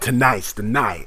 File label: Rap Vox